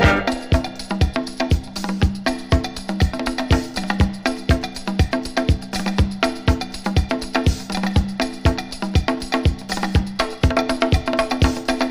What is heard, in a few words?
timbal, Drum loops